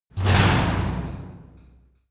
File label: garage door close short hard